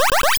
Bubble

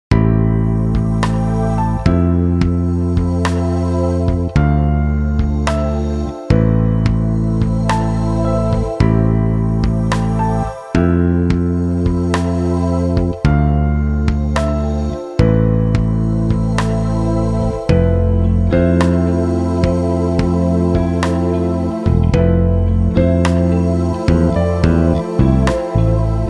Confession - Secret -piano version-; Persona 5

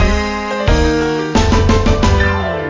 bgm raid quest intro

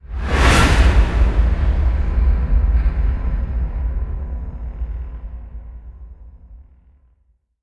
echoes; thief 2014 gamerip

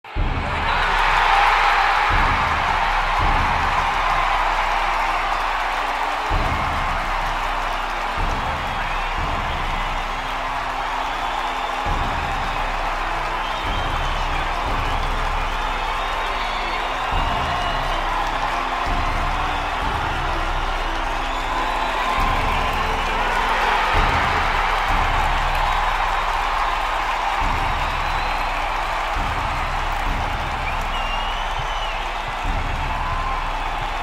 Stadium Ambience; Asterix at the Olympic Games

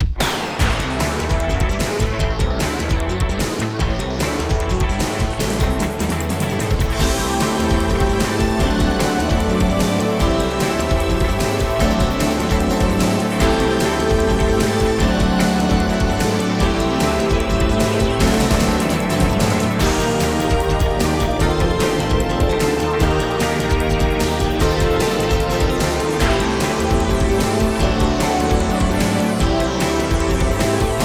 Menu Music; US Bonus Disc Menu Music (Unused)

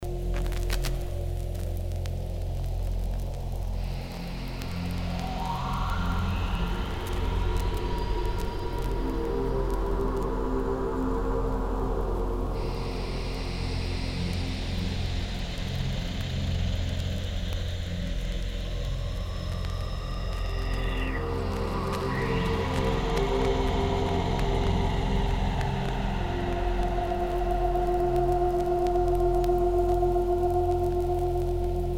Menu Music; Bucket Detective; Windows

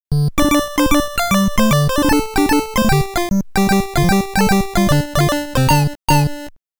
Pattern Clear; Ganbare Goemon Sound Treasure Box

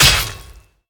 Attack Sword

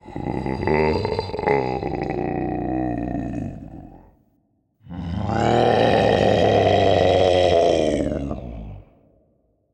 Mob walk

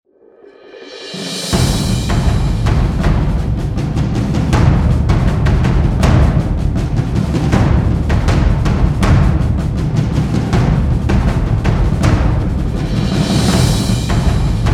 Action Drums; Air Guardians; Windows

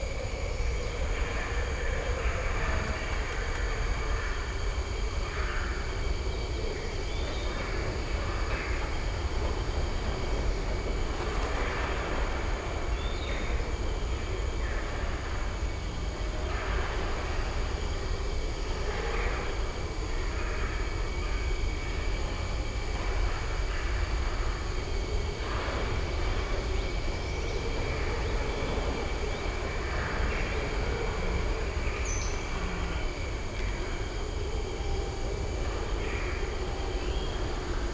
Ambient

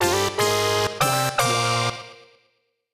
SPACEDISCO STING; Lego DC Super Villains 2018 Nintendo Switch

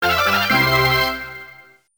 Success; Magical Date EX; arcade

